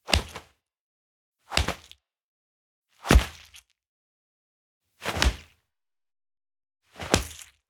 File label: Fight Punches